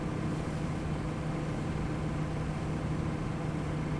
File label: fixed humming